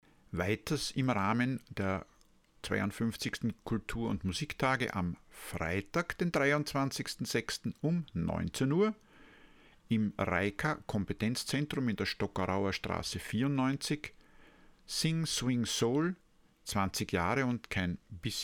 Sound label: Sing Swing Soul; RAIKA Kompetenzzentrum